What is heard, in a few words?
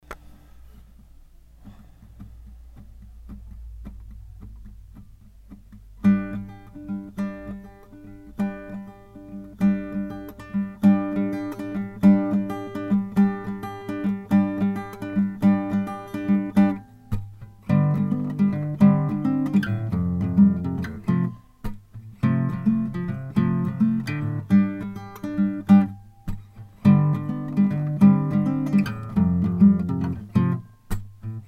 Chords